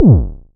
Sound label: modular pitched, Kickbank; mpk mini presets; Ableton Foundation FreePacks